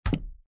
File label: bounce